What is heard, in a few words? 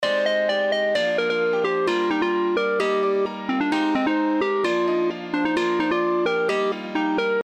melo piano